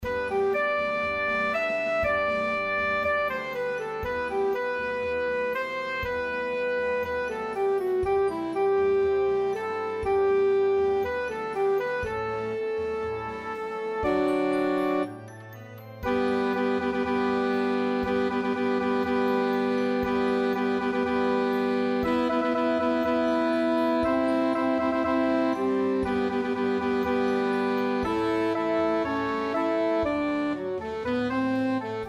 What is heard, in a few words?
sax soprano Bb